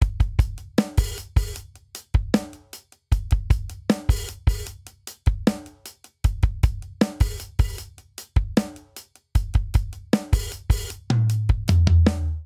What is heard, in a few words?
Grunge Rock Chorus 77 bpm; 4 4; MIDI Clips; Ableton; Factory Packs; Drum Booth